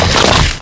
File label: impact; zaero; monsters; bossz